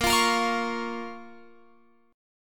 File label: Bb Minor Bb down Guitar Open F; Bbm strummed